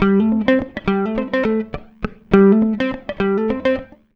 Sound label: 104 FUNKY, Electric & Acoustic Guitar Loops; USB Soundscan vol.04; AKAI